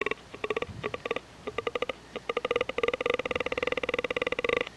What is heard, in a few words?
YOUNG BUCK TENDING A DOE